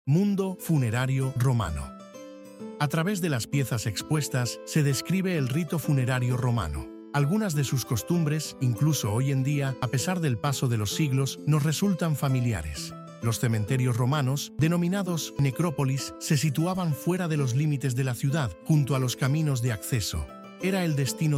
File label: music